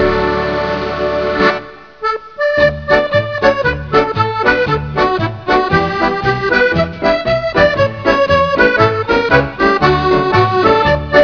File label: Jig; Posties